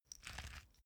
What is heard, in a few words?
small pepper grinder glass ringing soft; industrie-wirtschaft; gastronomie